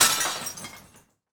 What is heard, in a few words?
ceramic break; soup